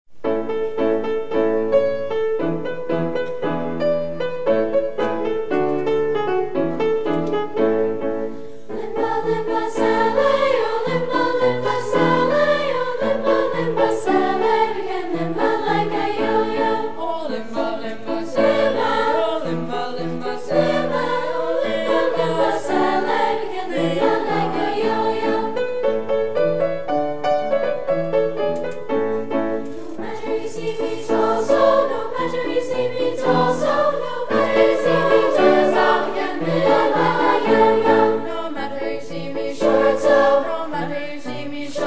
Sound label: Limba, Limba Sally - Guyanese folksong